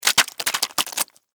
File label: Impact Splat Juicy, Wet; Blood and Guts; Horror